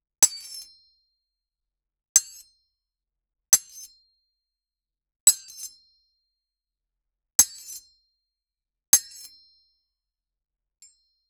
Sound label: hammer on chisel sharp; crafting